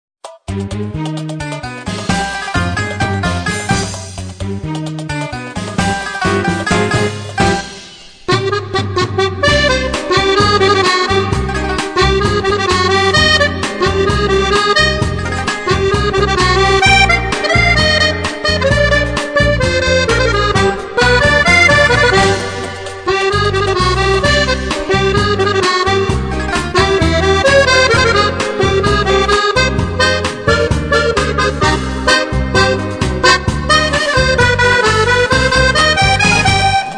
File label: chacha oriental